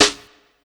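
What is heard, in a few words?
SD -R, snare drum, Drum & Bass; Ueberschall; Drum & Bass Resonance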